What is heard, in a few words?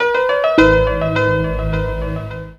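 SMS ringtone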